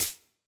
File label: Tap Good; bansonic; BANSONIC SFX